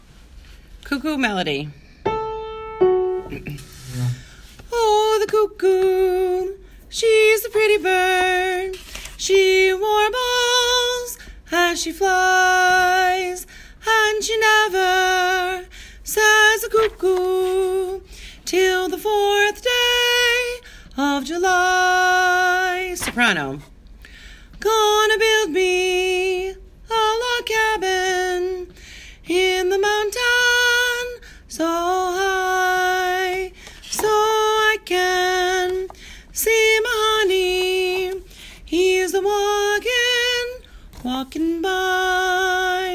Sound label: Soprano With Descant; The Cuckoo